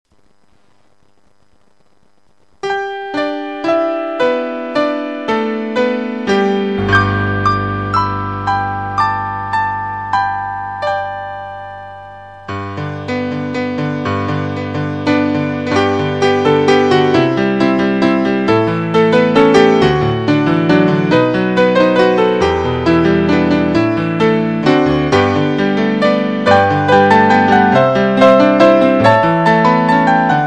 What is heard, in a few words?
Christmas Piano We Wish You A Merry Christmas